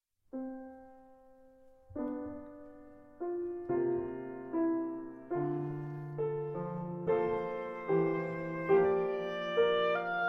Trio in A minor Adagio

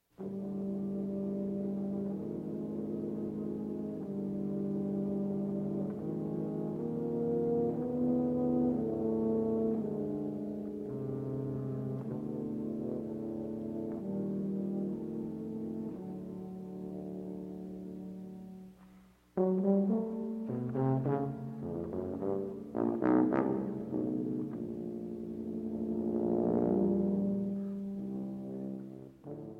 Tubas Chorale

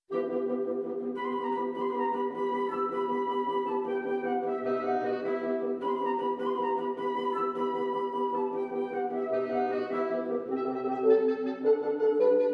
Quintet; in E Minor, op 88, no.1

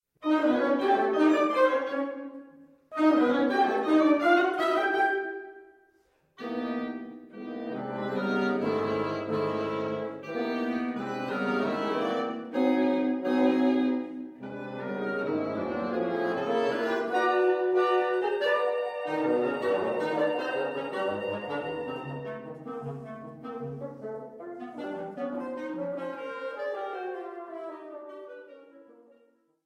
Woodwind Quintet

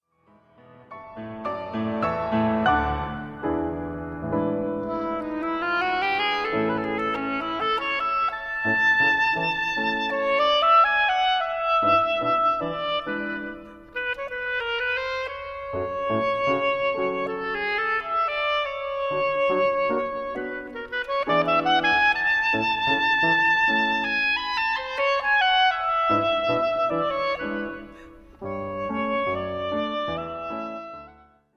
Fantasia oboe entrance; sull'opera Poliuto di Donizetti

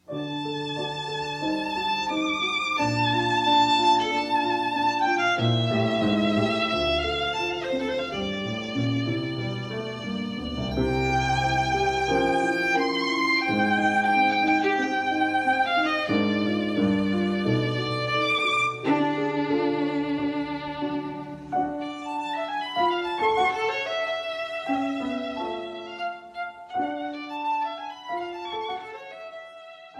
Sonata in D major

